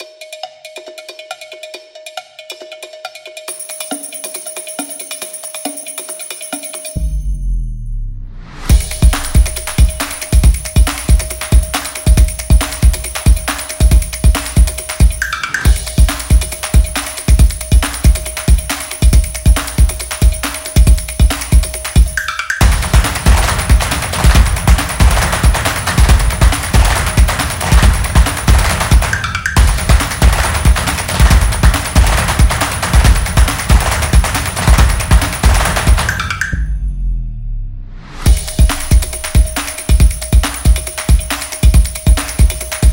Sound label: Fast Drum Beat